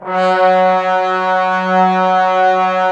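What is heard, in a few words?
brass trombones F#, Woodwind Brass Ensemble; 90 s Sample CDs; Miroslav Vitous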